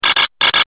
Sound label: Notifier Loud; Blackberry Bold